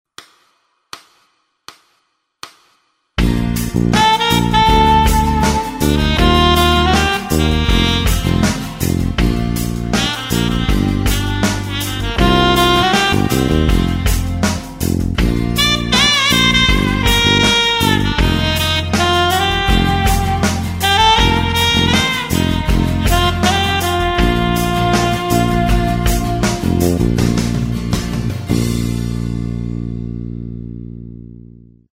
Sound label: alto, sax